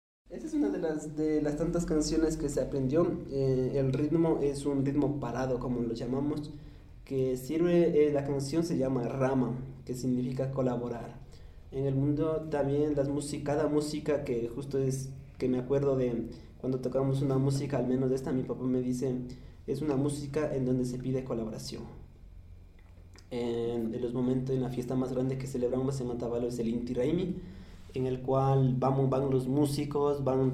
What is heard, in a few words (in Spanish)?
voz, kichwa otavalo; Kichwa OTAVALEñO